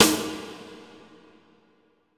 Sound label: Ex Drum